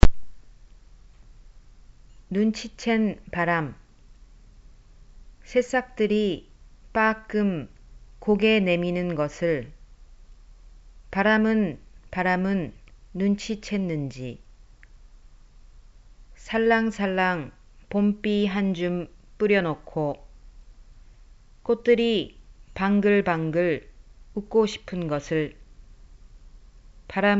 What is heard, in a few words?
wind